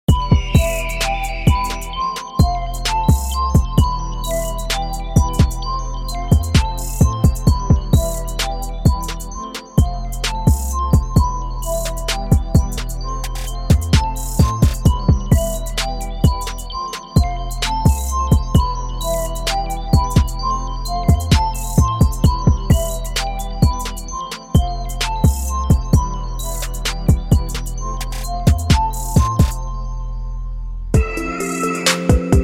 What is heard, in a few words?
trap jungle